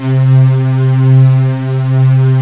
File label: LUSH STRING; Pro-Rec - Synthsonic; Z1 PADS